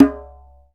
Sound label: Djembe; Digital Sound Factory Planet Earth